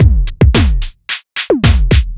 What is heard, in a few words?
Beat 110bpm; musicradar